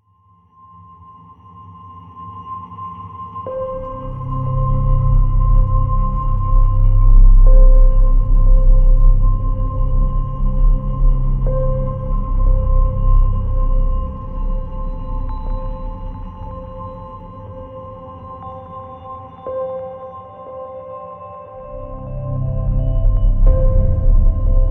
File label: suspense cue